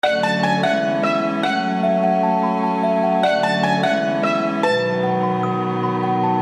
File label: Short Gothic